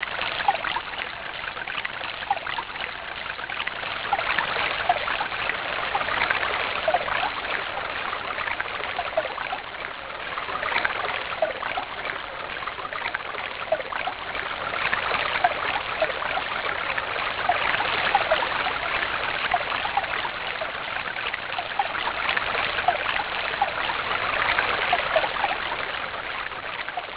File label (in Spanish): agua